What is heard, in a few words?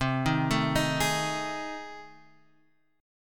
A Minor Major 7th Flat 5th